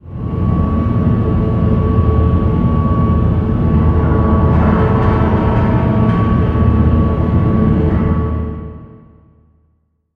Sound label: Pylon idle; Barotraumatic